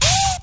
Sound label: clashing woo